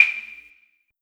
Perc Big Clav, 808; 808 Percs; Slate; Vintage Drum Machines Volume 2 808 Edition

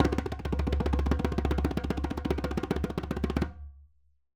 djembe Roll; Raw Perc One Shots; Slate; SD SEISMIC Drum Sample Pack

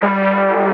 vocal push Gm; SD AURORA Sample Pack; Slate

